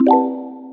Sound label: User Join